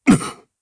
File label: Vox Landing, jp; Dakaris; kingsraid